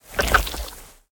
sand; smite; Anhur

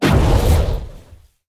fire activate; smite; god merlin

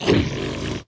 Snore Out; smite; kumbhakarna

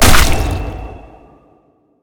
hit large; smite; odin

